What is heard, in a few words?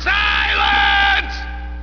silence